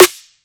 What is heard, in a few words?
DNB Snare Tone